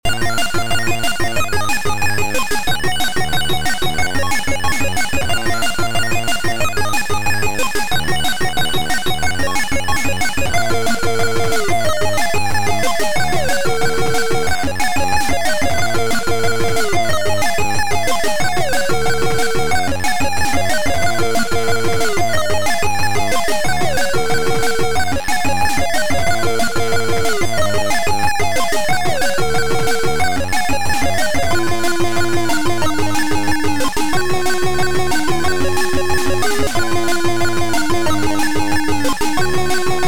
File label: Beeper; Mr. Beeper; Smoll